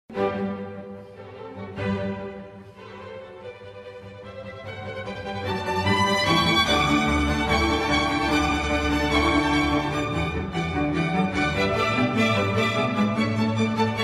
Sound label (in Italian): Ponte modulante